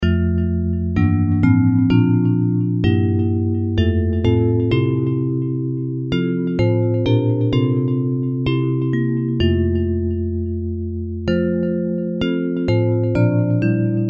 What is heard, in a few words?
Heal Us Emmanuel Hear Our Prayer Bells